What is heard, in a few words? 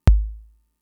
Kick, Drum Machines Fricke MFB-522